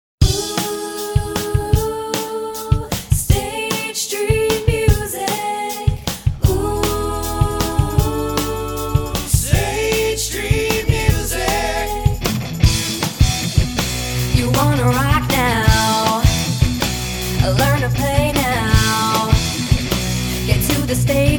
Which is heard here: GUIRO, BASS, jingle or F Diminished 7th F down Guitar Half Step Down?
jingle